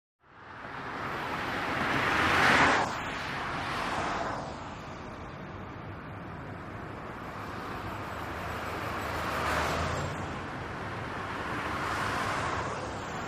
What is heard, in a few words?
Highway Traffic Bys, big city